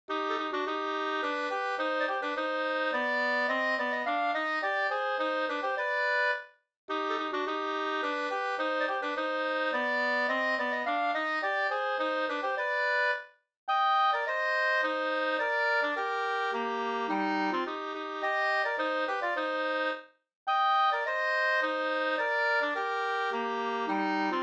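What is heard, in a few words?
polska, two-part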